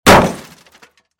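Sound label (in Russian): удар по машине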